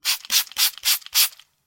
cleaning spray 5 times fast; soundscrate

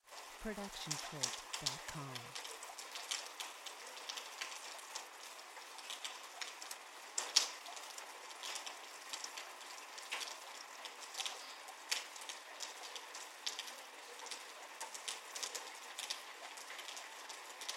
rain; soundscrate

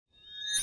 Motion Design Riser; SoundsCrate

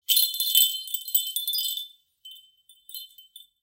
Wind Chimes D

